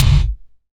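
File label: SDS V BD, bass drum; Best Service; Real Mega Drums VOL-1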